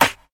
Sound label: airy sizzle snare drum sample d sharp key